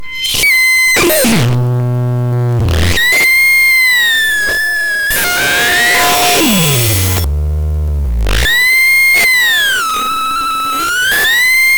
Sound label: feedback